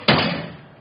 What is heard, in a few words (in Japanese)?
たたく, 生活音; 生活音系効果音